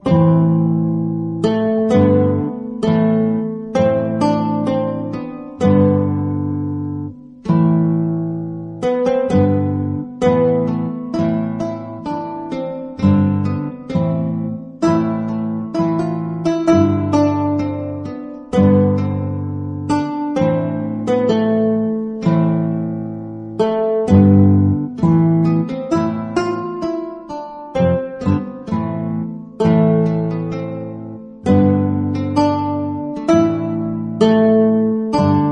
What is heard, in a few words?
romantic